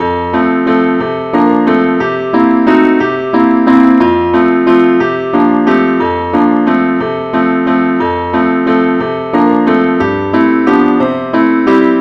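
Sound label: angel voices advance accompaniment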